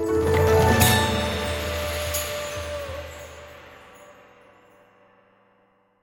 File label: bonus Intro; imperialriches